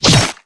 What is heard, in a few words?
knife